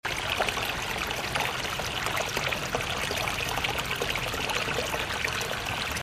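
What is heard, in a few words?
babbling brook; seseragi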